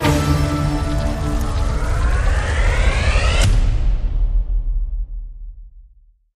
freespin Trigger; netent; magicportals

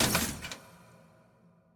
button Stop; netent; wishmasteroct

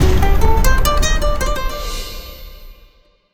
medium Win; narcos

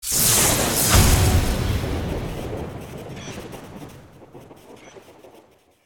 intro Free Spin; netent; neonstaxx

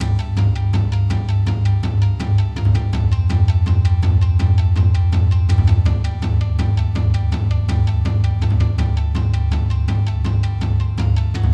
ambience free spin; netent; kingof3kingdoms

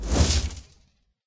swoosh by; netent; crosscake

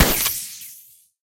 spin Button Click; netent

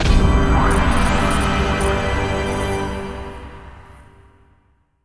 bonus intro; netent; bloodsuckers

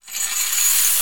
cluster Chain; netent; narcos

